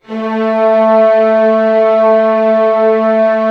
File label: orchestra A2 FF; Sound On Sound - Peter Siedleczek's Orchestra